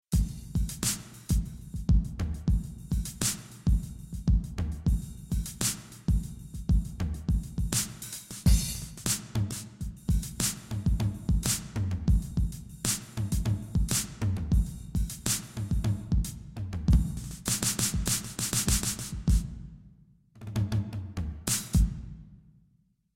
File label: Fusion Drum Set; sibelius sounds